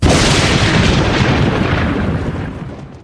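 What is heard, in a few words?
thunder lightning strike; ambience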